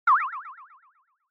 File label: Motion Pop